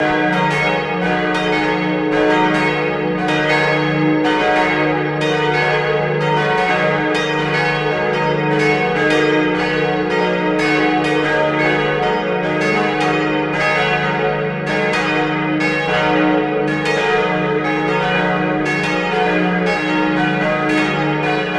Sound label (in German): Glockenläuten alle kurz